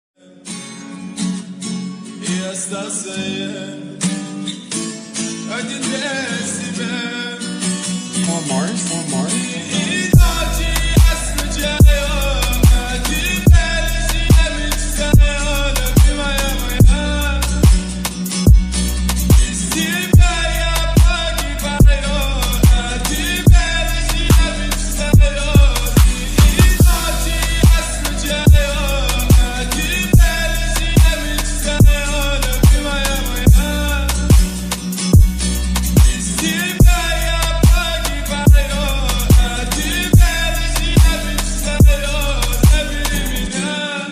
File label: remix bass boosted